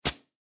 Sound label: Pneumatic Tool, Industry, Disconnect, From, Hose; Power Tool; BlastWave